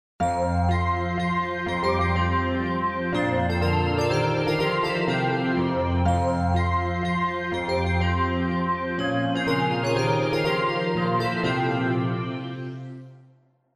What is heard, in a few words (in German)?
Gloeckchen Djingle; Haydns